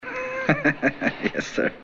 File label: laughter